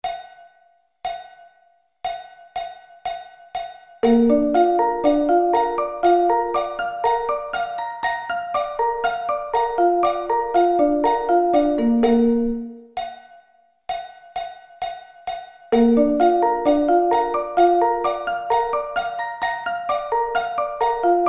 Bb Arpeggio Inversions

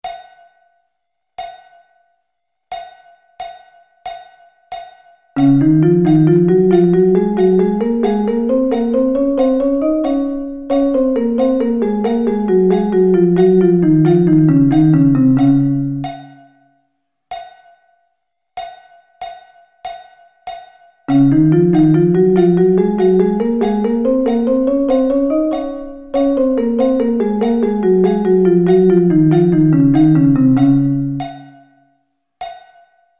Db Double Sticking

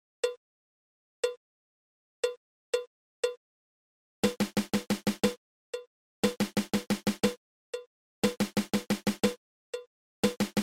Single Stroke 7

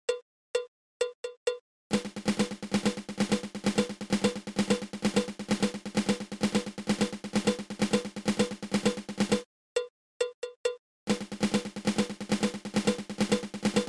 Patafla fla

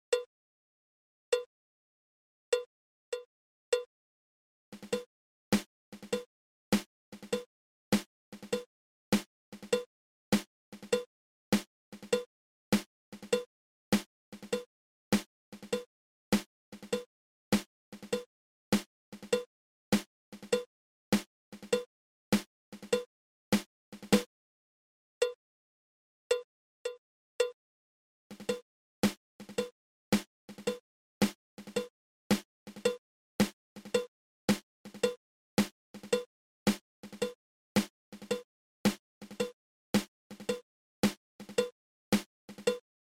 Single Drag Tap

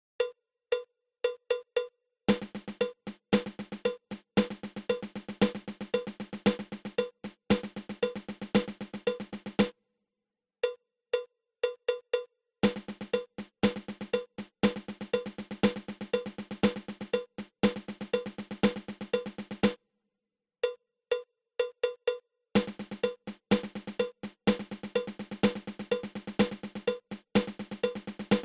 Triple Paradiddle

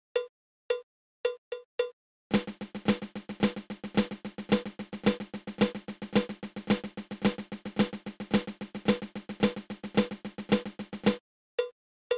Flam Paradiddle

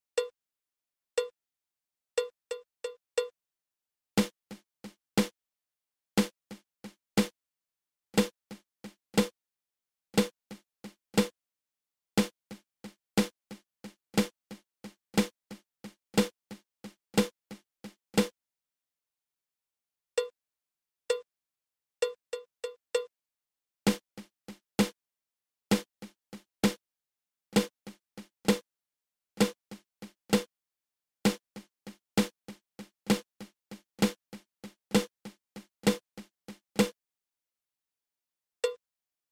Flam Accent

